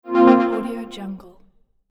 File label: bright ethereal video game exit menu sound; tony-parsons-music